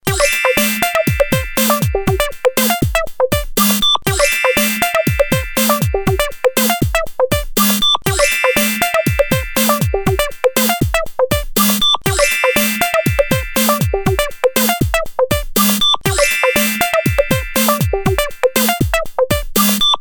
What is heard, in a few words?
Prog Hop, alarms; ZTE; Z992-Avail-2